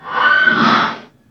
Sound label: horse; Illuminate